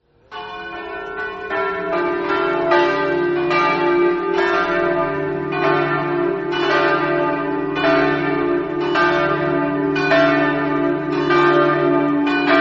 rizzi, campane

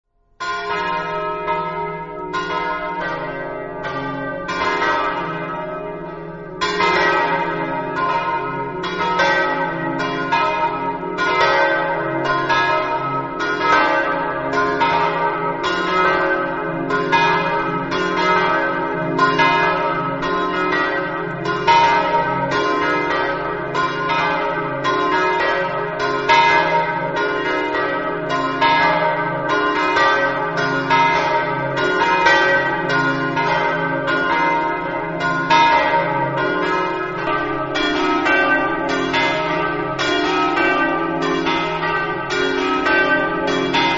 medea, campane

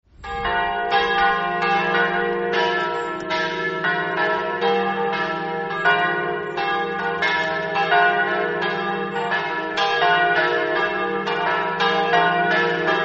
torviscosa, campane

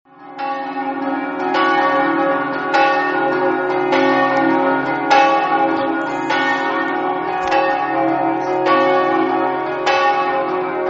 scampanottata, campane

